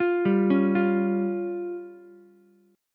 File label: C sharp Major Flat 5th, Ukulele, C#Mb5 chord; C#Mb5 arpeggiated; JGuitar